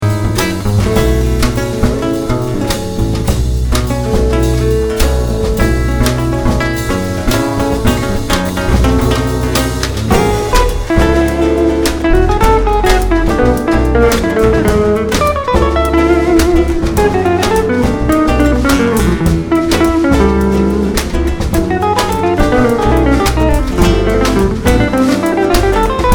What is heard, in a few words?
funky